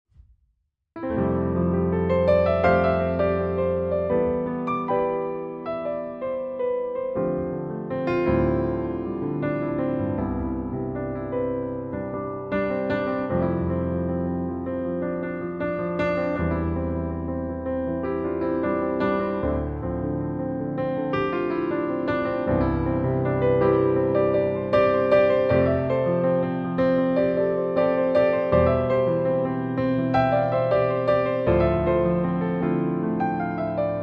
Piano Version

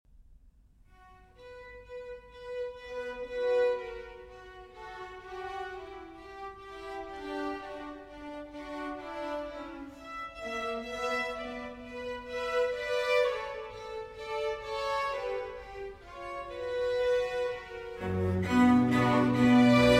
Cello Concerto in C Minor, Allegro; Vivaldi; RV401; 1. Allegro Non Molto

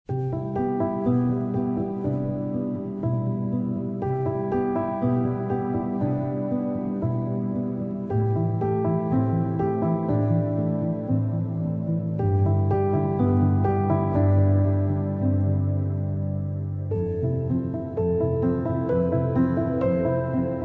Morning Chimes

